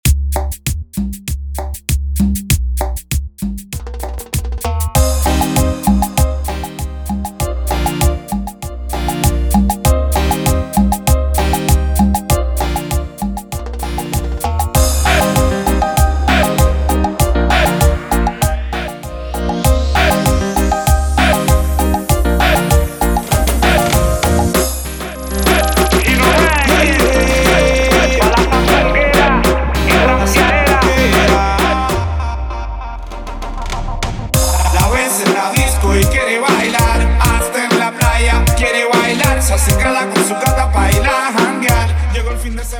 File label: Transition Cumbia To Reggaeton 98BPM